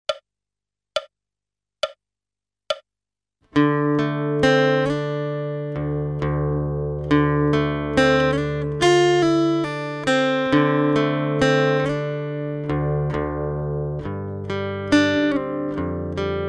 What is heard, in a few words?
D Modal Tuning, guitar